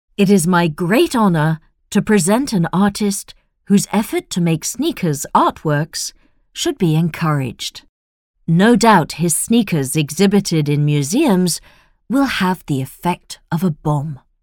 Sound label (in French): son techno